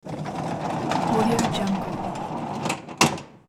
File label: barn door slide open; hollywoodedge